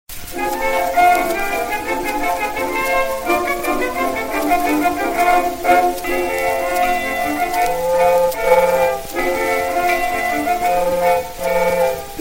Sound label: steamboat ringtone; android phones